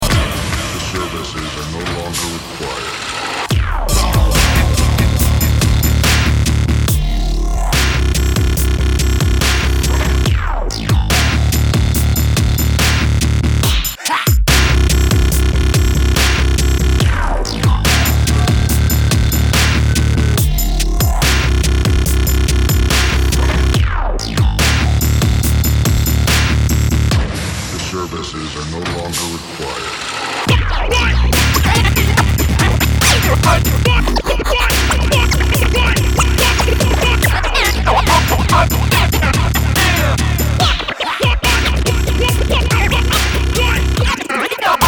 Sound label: CALYPSO